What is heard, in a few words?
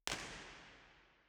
First IR Sala 2800 Genelec Left WY, Soundfield; Santa Cecilia Roma; Measurements 2005